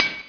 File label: Hit